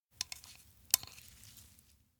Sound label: bbq pick chopsticks; anemoi